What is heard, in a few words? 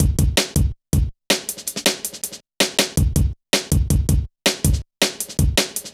Kicker, Jungle, Drum Loops 161.5